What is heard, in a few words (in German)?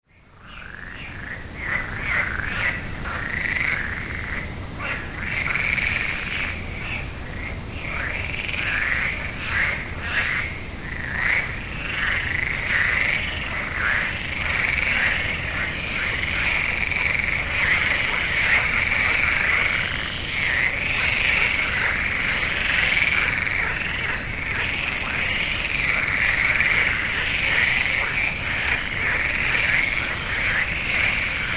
Froesche